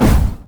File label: mage chains; LoL; ironforge xerath